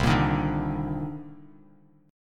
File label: C Minor 9th, Bass Standard; Cm9 strummed; JGuitar